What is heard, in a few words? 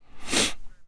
idle nose